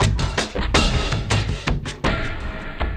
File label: Elektro Kik Cym Surprise, Drums; Sonic Foundry (Sony Creative Software); Friendly Fire